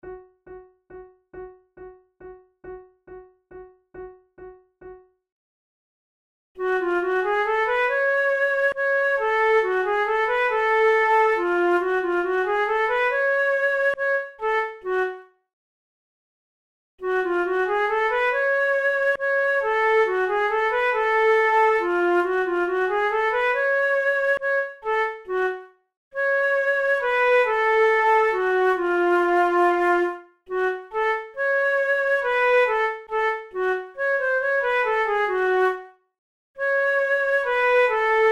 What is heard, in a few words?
methode de flute; tulou